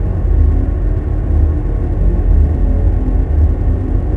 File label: garage, ambience; Star Wars Jedi Knight II Jedi Outcast; XBOX; artus